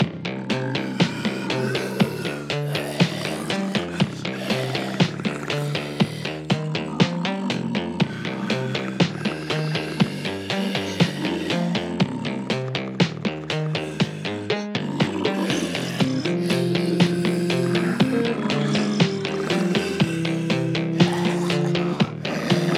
room arcade game zombie loop; Spacebase Startopia

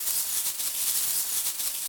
Sand

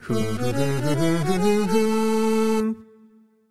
Stageclear; Mon Amour; macOS Switch Windows gamerip 2021

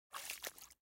step in water; out of reach treasure royale 2020 gamerip pc

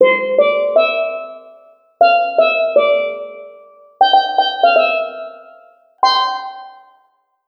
Drum Puzzle; escape academy; Escape From Anti-Escape Island

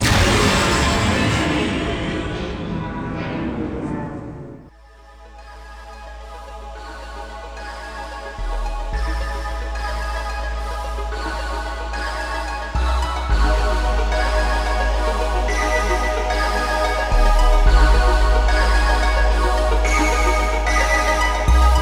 All Clear; sanvein originalsoundtrack 2020

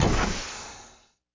elevator open

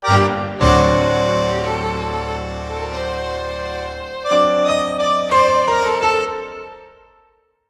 Tango Sequence; mass effect 3 citadel gamerip 2013; Silversun Strip

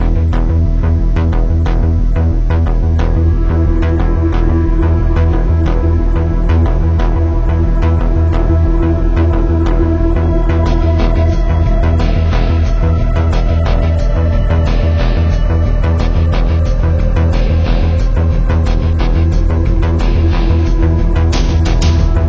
Tension Loop; Barter Town

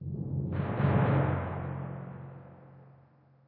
Tymp

